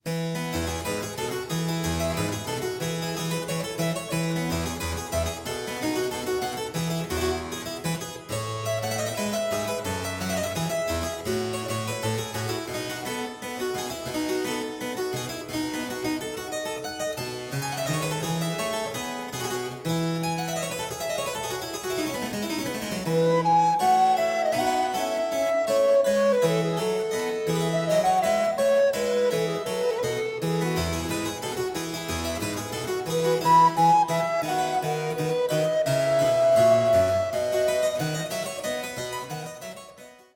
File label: Allegro